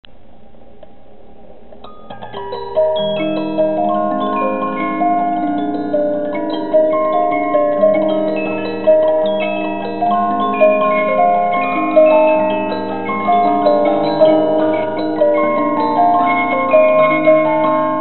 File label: musicbox